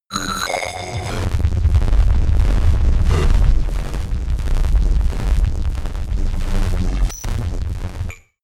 rumble glitch long, sciene fiction

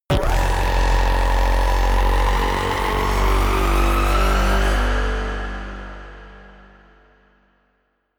reverb rumble, risers

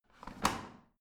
washing machine drawer in; haus garten; haushalt

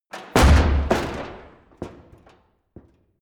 crash large metal box; objekte

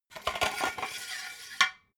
metal scaffold pole retract hard; industrie-wirtschaft

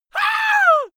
scream hysteric male; vocals; comedy-cartoon; soundpool

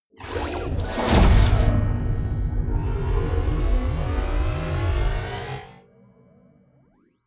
magic warp personal jump depart; swooshes-swishes

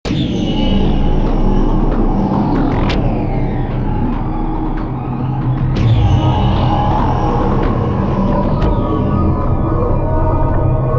drone mercury

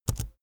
keyboard laptop enter press fast soft

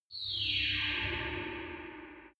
far planet beaming; energie

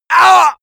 male hit; menschen; schreie

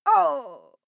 female fight moan pain

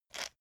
pepper mill soft; gastronomie; soundpool

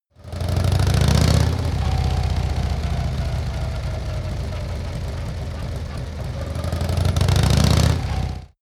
motorcycle harley accelerate triple short; motorraeder; verkehr transport

